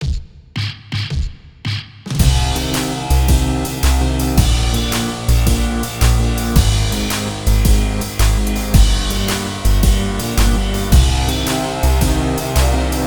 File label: Instrumental; Upcoming Cop